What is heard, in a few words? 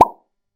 pop